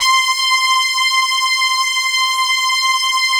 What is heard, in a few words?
PAD, ATMO PADS; Best Service; XX Large